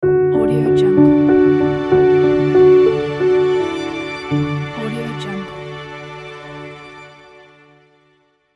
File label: soft piano and strings logo; musicalsmile